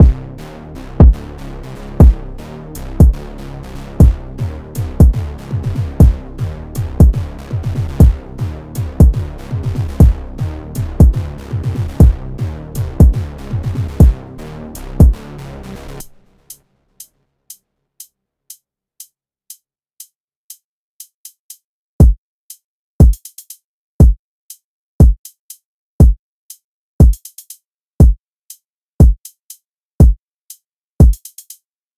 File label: Instrumental; Friday Night Funkin' Internet's Down PC gamerip